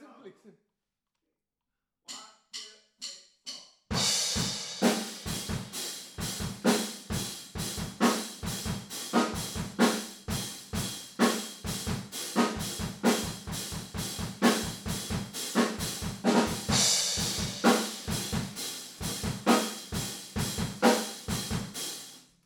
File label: TB GTR